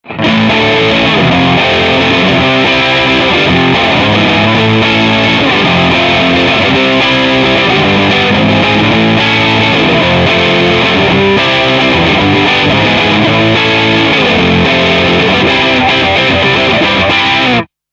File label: Punk; Sk8